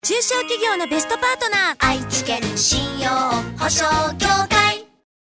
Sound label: sound logo